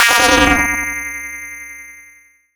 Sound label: 8bit sfx; Retro Games; SoundHolder